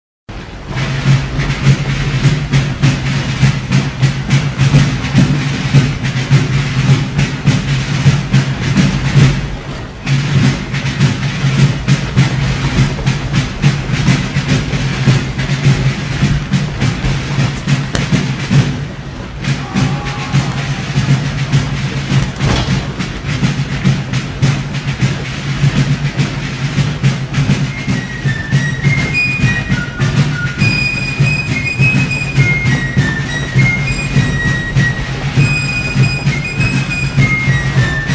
ambiance son cannonade

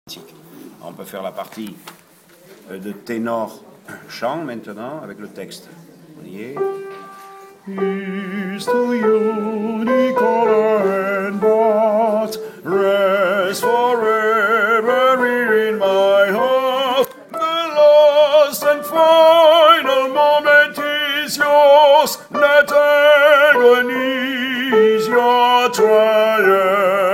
tenor chant

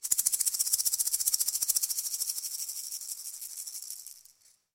maracas

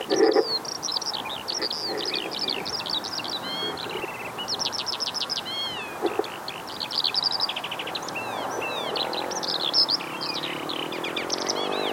alouette des champs moto; fresnes

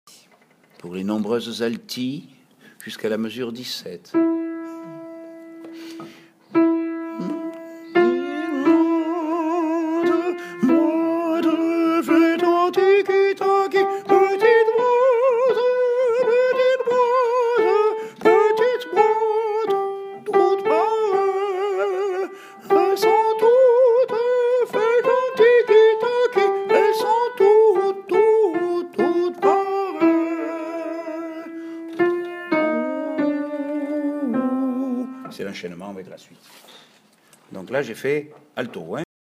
altos

